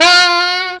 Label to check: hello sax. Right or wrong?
Right